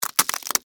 Crack Crunch, Texture, Crack, Crunch, Penne, Rigate; Food; Household; BlastWave